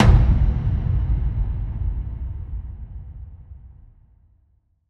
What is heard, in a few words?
Percussion Hit